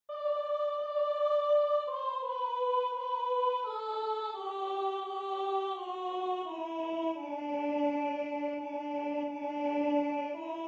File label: Away in a Manger - Soprano